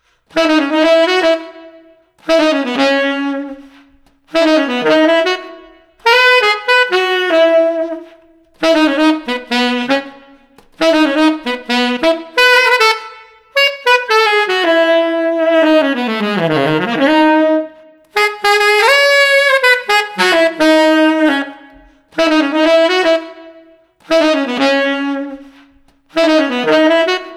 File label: Street Sax BeBop Melody